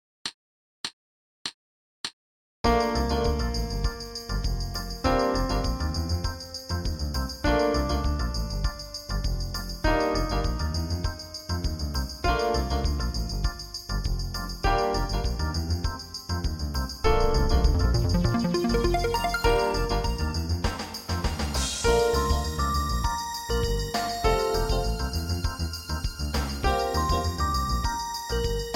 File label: B-flat Concert Whole Notes